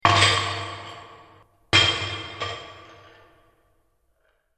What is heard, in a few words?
Dungeon Cell Impact; Miscellaneous Impact Sound Effects; fantasy sound effect; Andrew Fleming